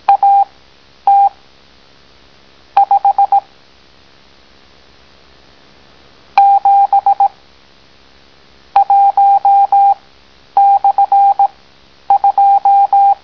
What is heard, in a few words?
slo code